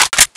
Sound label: aug boltpull